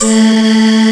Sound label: spacevox a3; Hexen Demo; Hexen Music; Hexen 6vox